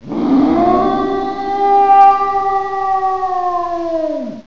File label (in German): Wolf, Wolfs heulen und Knurren